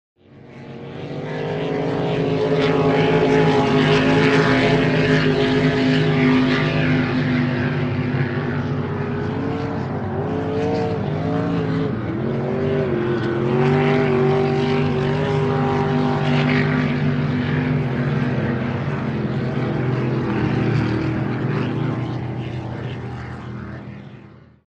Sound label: Power Boats By Fast C, off shore power boat